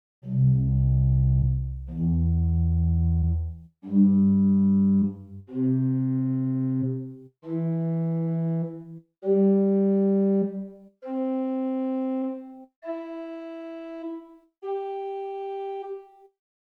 Ped Octave8 arp, organstops

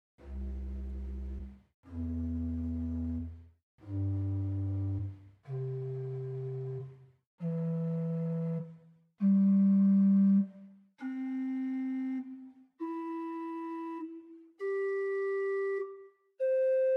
Hw Hohlflote8 arp; Grace Lutheran, Champaign

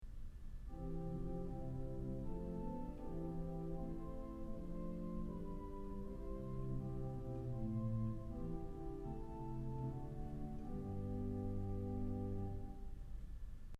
Celeste; Kellogg Auditorium

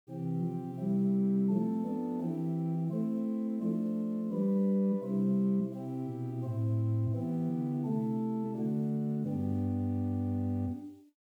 Sw Erzahler8, organstops; First Baptist Riverside

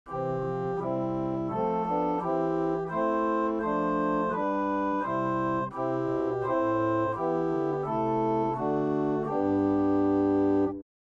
Salicional, organ; Romanswiller

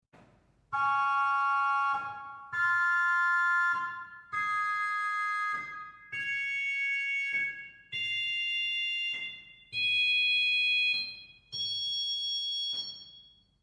Cornett arp, organ stops; Silbermann middle-sized church organ; Reinhardtsgrimma

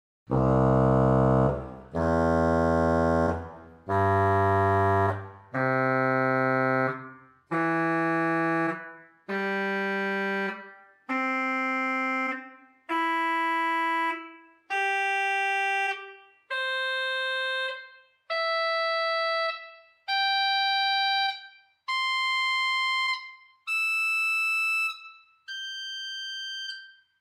Hw Trompete8 arp; Grace Lutheran, Champaign

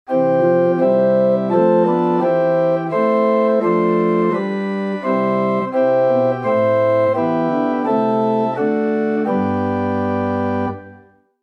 Prinzipal + Oktave, organ stops; Grace Lutheran Champaign

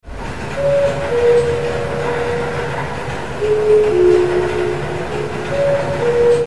Cuculus, organ; Gabler; Weingarten

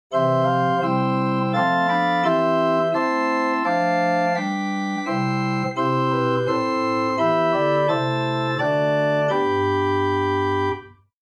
Quint 8 Prinz 2, organ; Buzard Opus 3

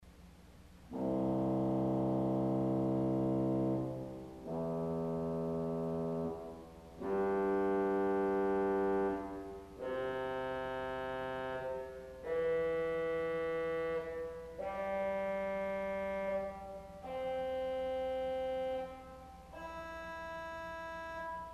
Eng Horn Arpeg, organ stops; CulverAcademy

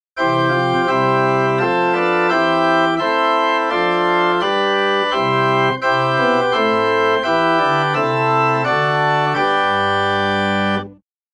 Mon Oct Doub Four, organ stops; Romanswiller

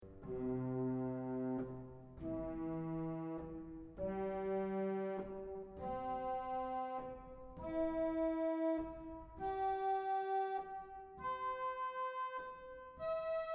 Viole Celeste arp; Mainz

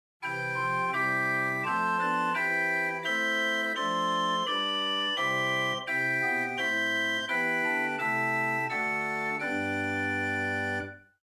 Gedackt Rohrflote Quinte, Chamber Organ

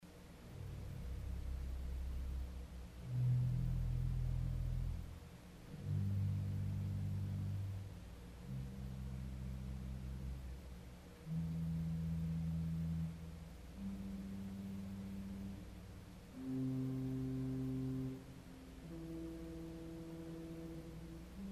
Flute Conique 16 8 Arpeg, organ stops; arpeggio; CulverAcademy

